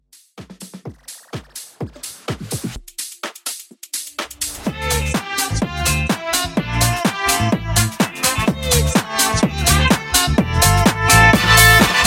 House Quick Mashup 126 CLEAN

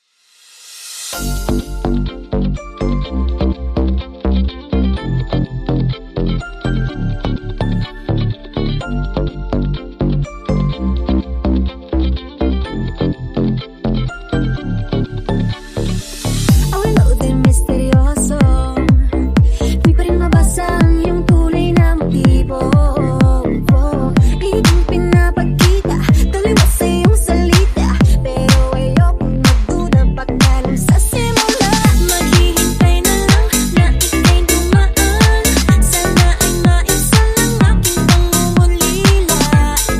Deep House Remix

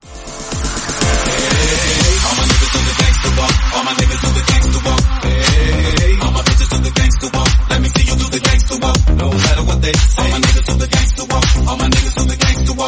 Snippet 121bpm Cm

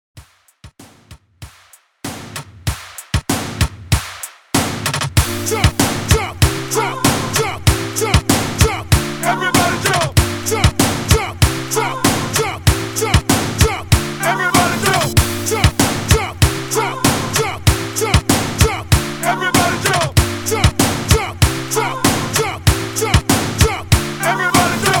96 BPM

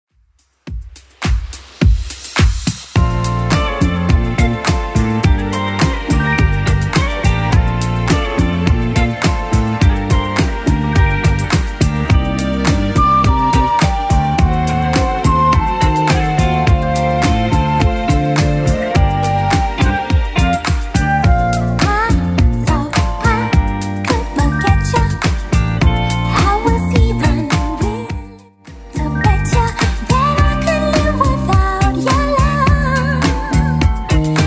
80s Club Edit 105bpm

